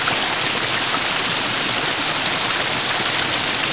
watrfall SML; The Elder Scrolls III Morrowind GOTY; XBOX

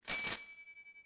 bike; hongkong; ambience; Soldier Of Fortune II Double Helix; XBOX

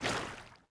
water wade shallow

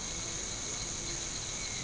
swamp; Ambient; MX vs ATV Unleashed; XBOX

